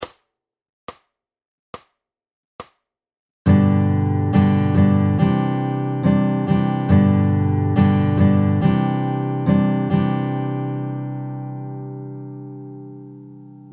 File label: varying strum patterns ballad strum, guitar